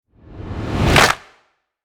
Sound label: Cinematic rise and clap